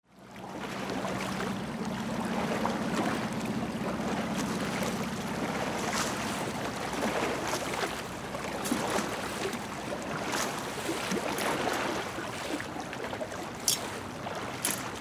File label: waves